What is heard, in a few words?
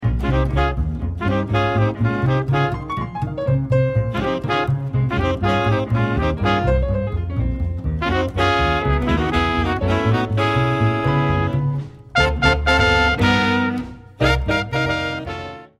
minus drums